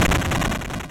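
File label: explosion various, Retro Game Weapons Sound Effects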